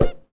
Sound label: cue hit, Billiards; VRCBilliardsCE